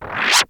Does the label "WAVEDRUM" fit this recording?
Yes